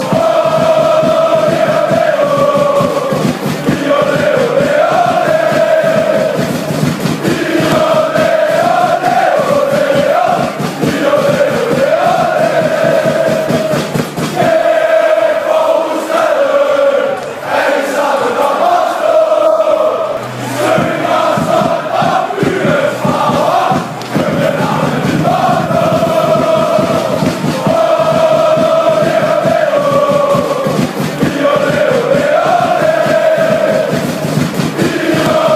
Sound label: fan chants; fight for the capital